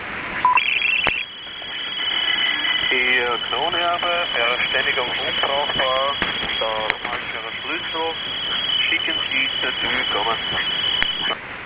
TADIRAN VX BYPASS, TADIRAN HF-2000/HF-6000 analogue voice security; voice encryption, vocoder & voice compressor